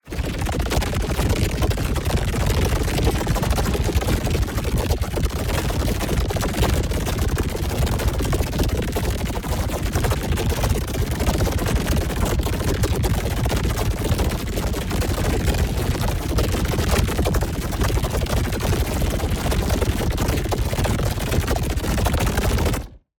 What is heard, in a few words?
ABILITY CHARGE Electrified Conduit; boom library; Matter